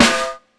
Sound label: SD, dance drums hip